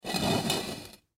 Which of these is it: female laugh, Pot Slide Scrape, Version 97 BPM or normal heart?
Pot Slide Scrape